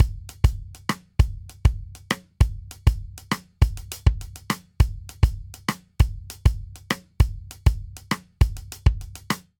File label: Reggaeton Breakdown 100 bpm; 4 4; MIDI Clips; Ableton; Factory Packs; Drum Booth